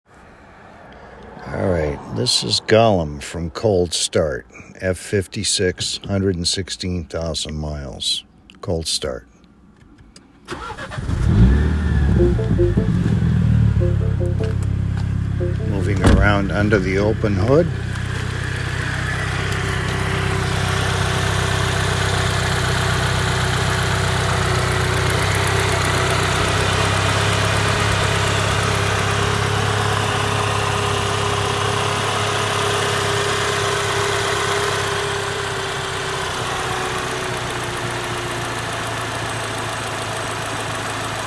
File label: normal engine noise